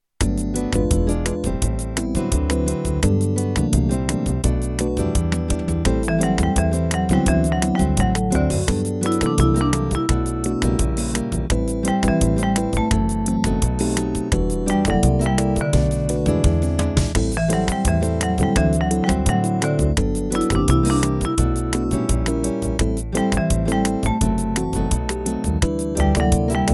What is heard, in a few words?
Jazz